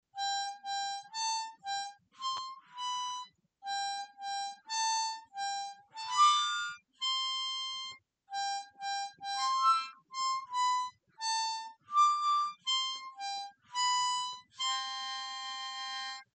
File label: Slow, harmonica, Happy Birthday